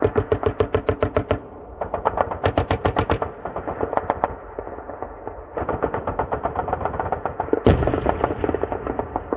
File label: battle loop, roof top; hl2